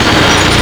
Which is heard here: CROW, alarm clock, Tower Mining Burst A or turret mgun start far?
turret mgun start far